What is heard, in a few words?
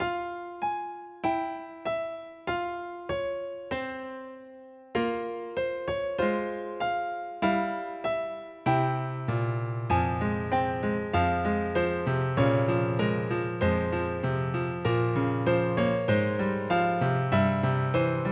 piano song